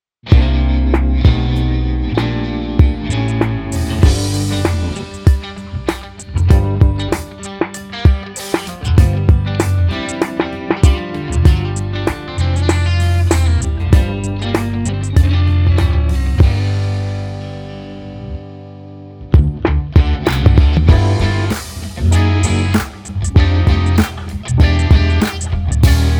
Groove Comedy

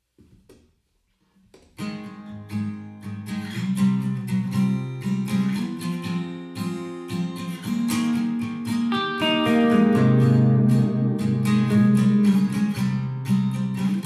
Guitars Melo; OF FATHERS AND SONS – Soundtrack

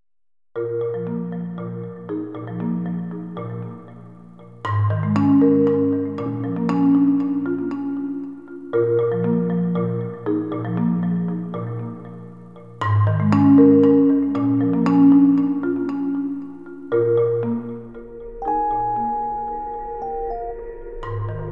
Sauna Mallets

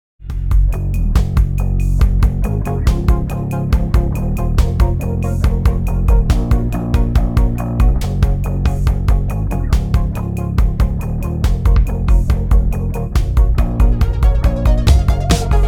Electro; OF FATHERS AND SONS – Soundtrack

